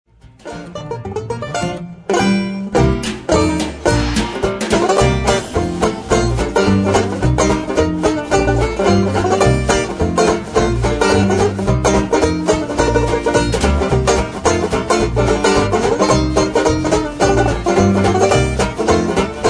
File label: banjo